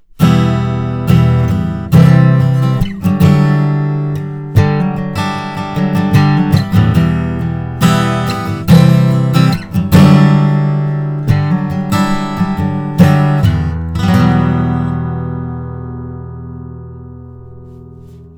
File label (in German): SC 440 USB Podcast Westerngitarre Strumming; t.bone